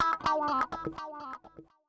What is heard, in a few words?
ELEC GUIT HOUSE 125 A, Guitar; eJay